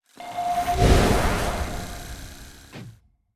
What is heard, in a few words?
Target Tracking